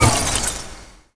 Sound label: mana break; weapons; dota; antimage